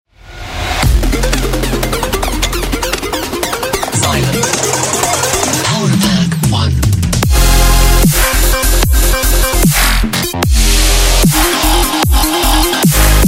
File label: Dubstep; Splice Sounds; Xilent Powerpack 1; Splice Sounds - Xilent Powerpack 1+2; 现代Dubstep多风格采样包